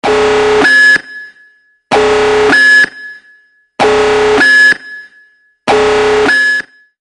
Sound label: Super Alarm